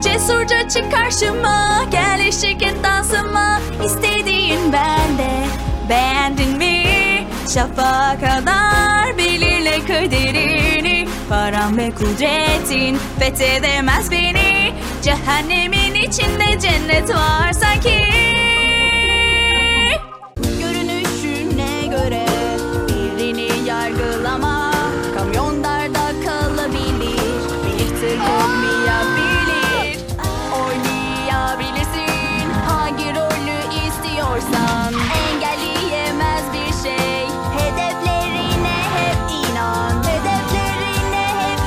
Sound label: vocal